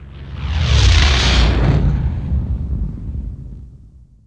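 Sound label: shp flyby, ship; millenium falcon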